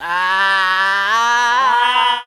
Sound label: ahahwow